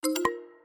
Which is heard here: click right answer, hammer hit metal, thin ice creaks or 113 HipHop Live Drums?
click right answer